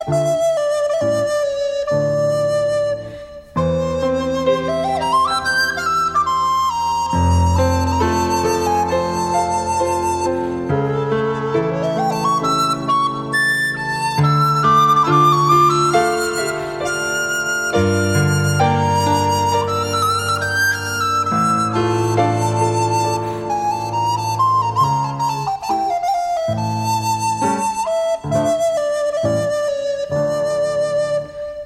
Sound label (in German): Czardas